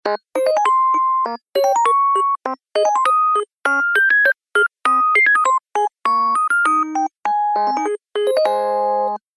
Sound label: Game Over, phonetones Ringtones; KU9600 Crystal Prototype